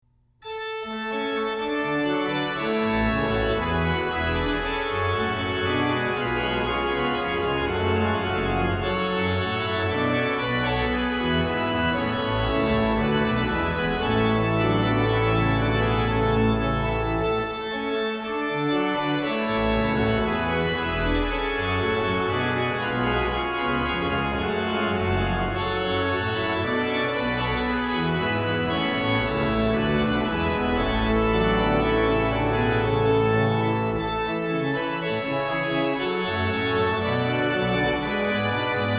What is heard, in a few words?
Chorale Prelude; BWV 601; Bach